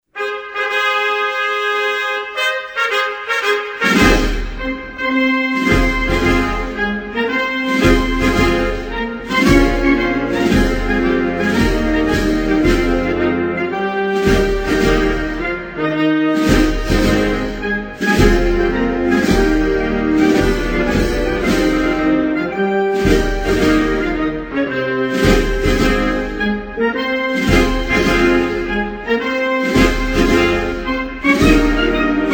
Irak, Hymnes nationaux

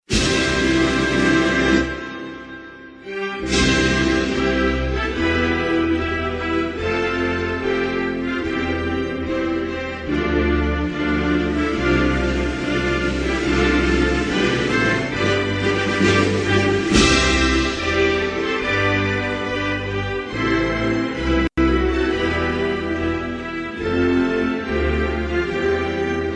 Russie, Hymnes nationaux

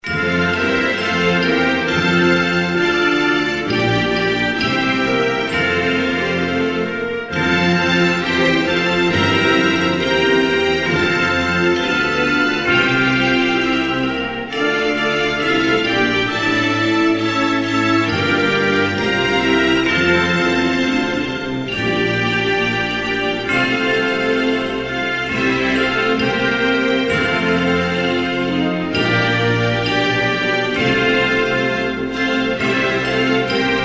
Tanzanie, Hymnes nationaux

